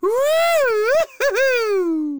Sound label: Man Woohoo; Zomboid; Lifestyle